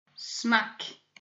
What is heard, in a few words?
smack